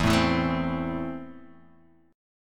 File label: E Suspended 4th Sharp 5th E, Guitar, DADGAD; 2,0,2,2,3,x; down